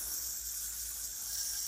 fidget spinner mini loop; Golden Crayon Sounds

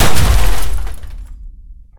remgun death; Original TK Sounds

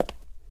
cement step; Golden Crayon Sounds

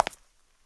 marble step; Golden Crayon Sounds

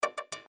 Empty Space; Schemes for iOS; ESAN VO Sound Schemes; ESAN Business SSound Pack

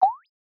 Text Message Acknowledgement Question Mark; iOS Sounds

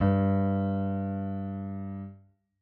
piano g-2; Golden Crayon Sounds

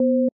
Dtmf 1 Loud; Skype Sounds

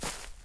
grass step; Golden Crayon Sounds

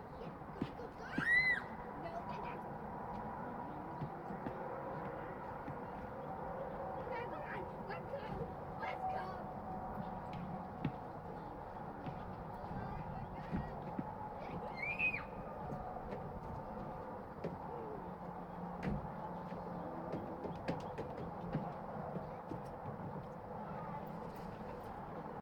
park basketball and kids; Golden Crayon Sounds

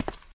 concrete step; Original TK Sounds

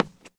concrete step; Golden Crayon Sounds

